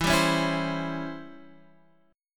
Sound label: Db Minor Major 7th Flat 5th E